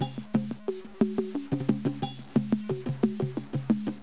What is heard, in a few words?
song; bugworld